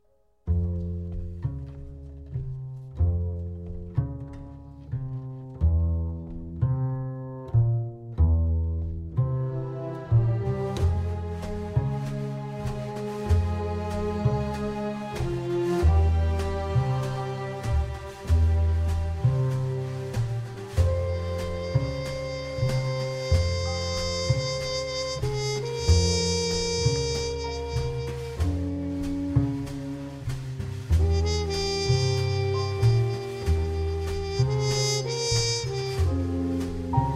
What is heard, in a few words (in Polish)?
Adagio f-moll